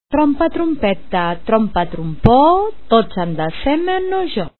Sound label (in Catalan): trompeta